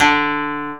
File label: KOTO D2, Asian Traditions; USB Soundscan vol.60 - Asian Traditions; AKAI